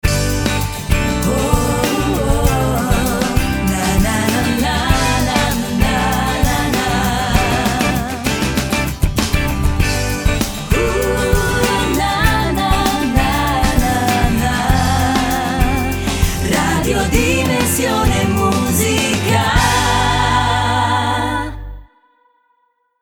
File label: Singing commercial, italian